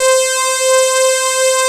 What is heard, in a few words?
SYNTHS; Pro-Rec - Synthsonic